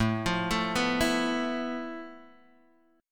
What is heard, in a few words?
F Major 7th Flat 5th A, guitar chord